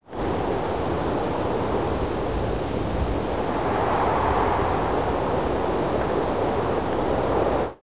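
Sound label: wind light loop; Star Wars Obi Wan; XBOX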